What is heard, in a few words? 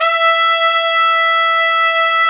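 trumpets section; Roland L-CDX-03 Disk 2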